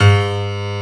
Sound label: PIANO